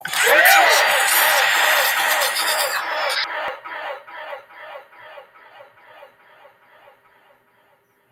buttons played at once

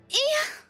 iiyyaaaa

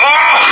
pain; cloaker

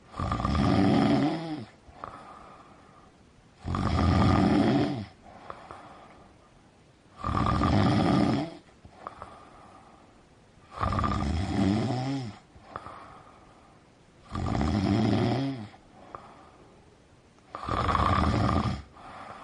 snoring

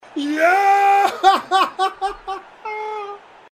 jaaaahahahaha

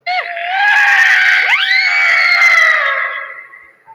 screaming

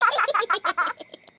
laughing; bluey; bingo

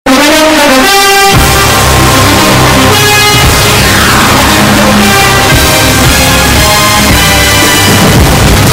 theme bass boosted; nfl

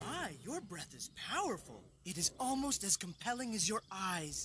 powerful breath

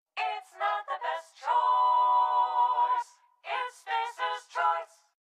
jingle; spacers choice

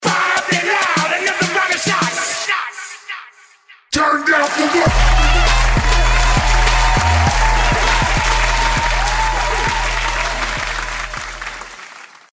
claps

